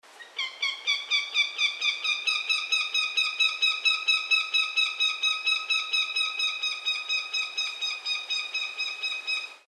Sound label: Goshawk Call; Raptors